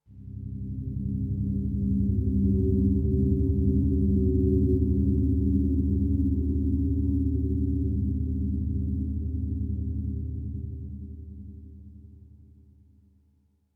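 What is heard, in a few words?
Day Ambience Stinger; dying light gamerip 2015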